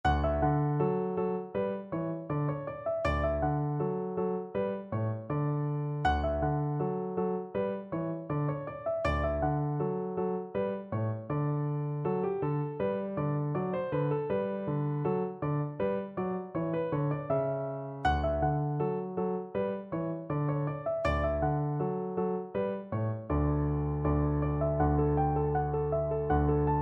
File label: bach gavotte piano; bwv1012